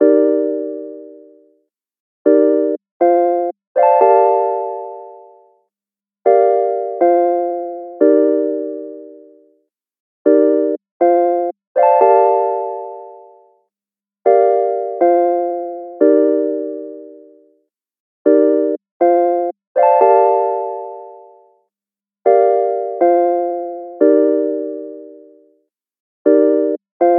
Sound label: 120 BPM G Min Pluck; Cymatics; Blue Eyes